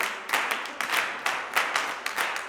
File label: CLAPS 96; Spectrasonics; Vocal Planet; Blues Soul; G. CLAPS